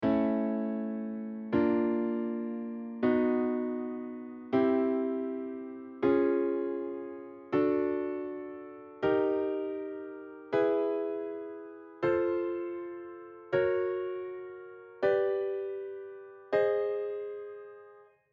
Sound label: All Triads Pythagorean, tunings